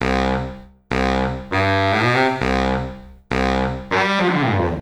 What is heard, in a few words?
Bariton, brass funk; brass сэмпл в стиле funk